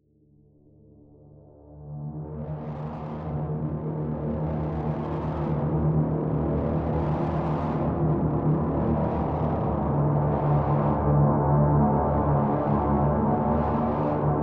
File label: intro strider, music; Half Life Alyx